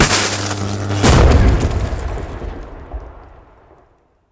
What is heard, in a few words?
electrical explosion, battle